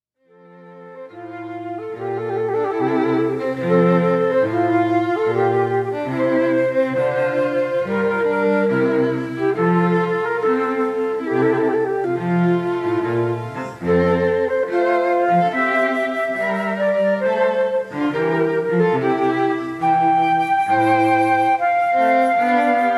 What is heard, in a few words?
Air Water Music